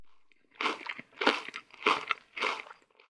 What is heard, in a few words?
consume food chew soft; consumables; food-and-drink